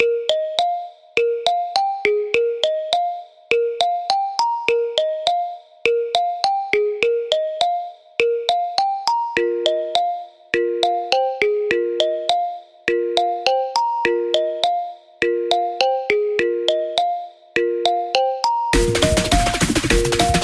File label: Instrumental; Friday Night Funkin; Mousetic